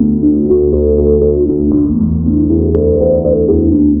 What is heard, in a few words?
bass